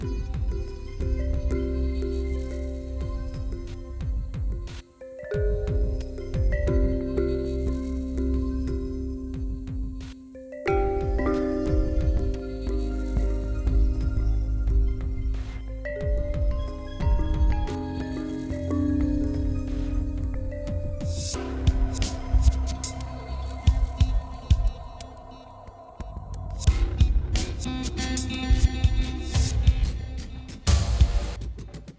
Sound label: soft; rise and shine linux ps4 switch windows xbox one gamerip 2017